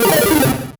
Music Effect Solo Chip Arp Negative, Stereo; Kitty Nigiri

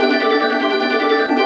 ui loop shiny

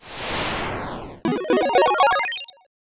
amb 8bit retake intro

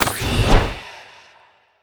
Multishot Checkpoint; we are the dwarves gamerip 2016

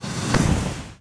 flamebearer teleport out

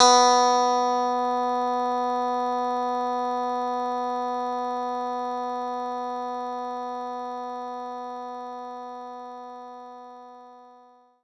Clean Guitar B3; knights try

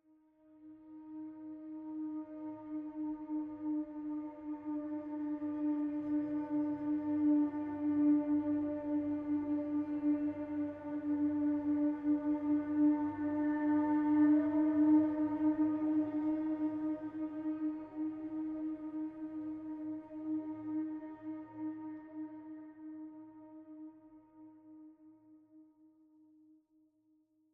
Tension Mood

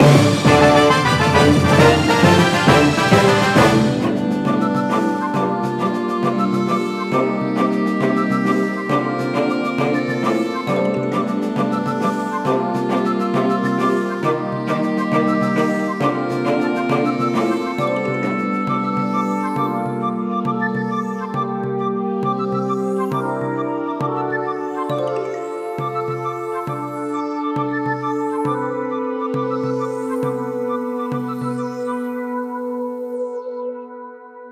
Leave Port Fanfare; Steel Diver

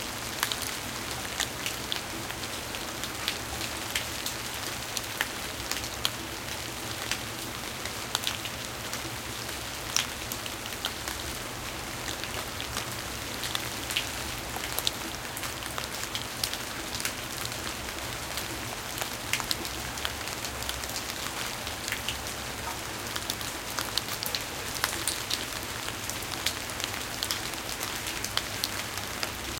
Rain Leaves; bejeweled 3

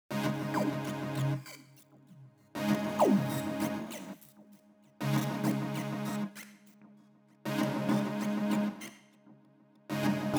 Chords Glitch; Get Even

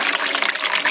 WATER

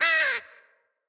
Ambient

